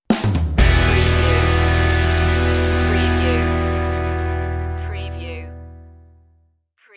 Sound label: dirt stinger